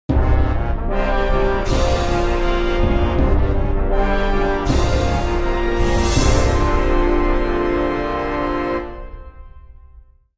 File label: jingle